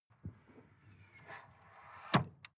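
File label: sports car exterior trunk hydraulic; verkehr-transport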